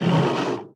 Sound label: Attack